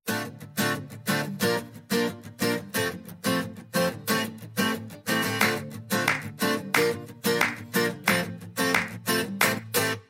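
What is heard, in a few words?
background music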